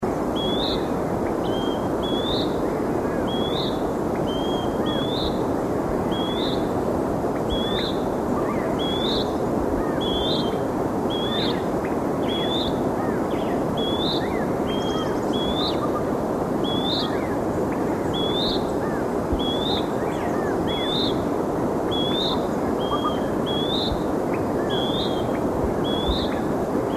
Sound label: Contopus